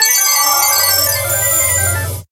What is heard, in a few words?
fusion progress; leonardlab